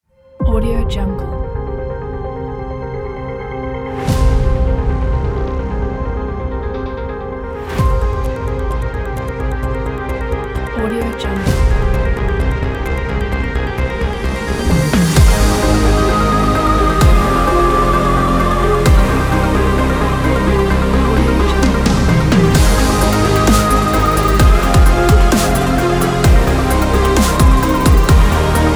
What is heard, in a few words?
epic; goodideaproduction